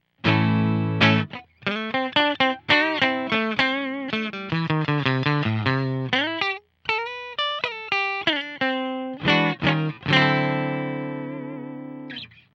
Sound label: clean